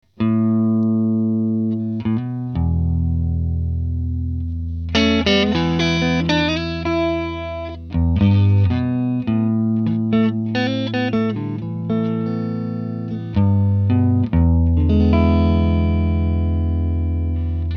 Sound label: Blues